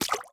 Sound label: fall into water